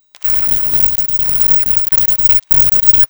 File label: vocal